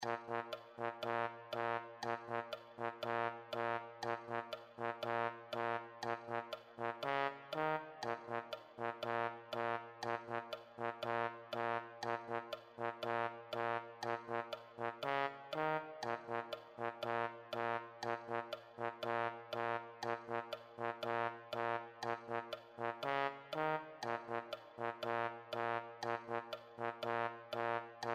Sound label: Trombone; Avengers; Level 2